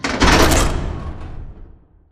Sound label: Delivery Cage Out Stop; Titanfall 2; Boomtown